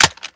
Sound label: reload fast mag in; gmod; viper